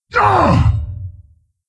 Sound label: Wound, Creature; Steelbreaker